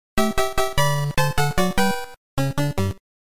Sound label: Game Over; SMB3